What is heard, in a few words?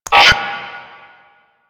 Radio Click; Kahl; Warframe; The New War; Grineer Eidolon